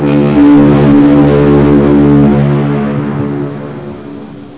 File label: Foghorn